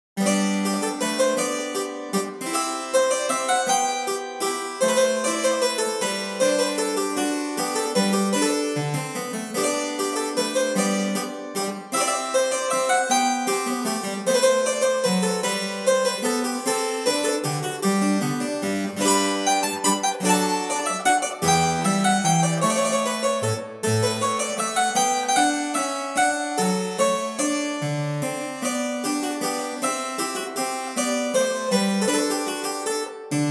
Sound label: harpsichord, Authentic reproduction of a classic harpsichord; CineHarpsichord; Cinesamples